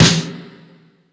Stadium Rox, Drum Machines, E-MU Pro-Cussion

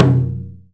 TAIKO; AKAI S-Series CD-ROM Sound Library VOL-8; PERCUSSIVE

